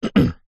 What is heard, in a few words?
human man clear throat